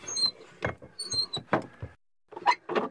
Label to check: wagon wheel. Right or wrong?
Right